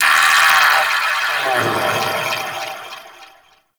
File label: Vocal Death Dissolve; Warframe; Duviri; Dax; Ashigaru